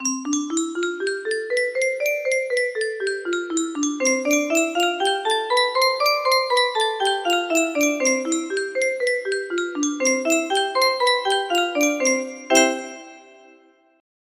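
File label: c scale with arpeggios, music box melody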